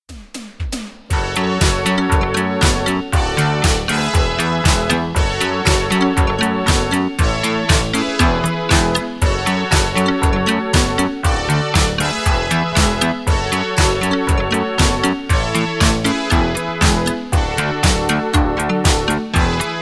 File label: Instrumental